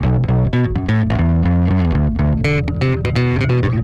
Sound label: Garage Fuzz-Bass Bbmin; Dance DJ; Digidesign; Pro Tools; Pro Tools Essential Loops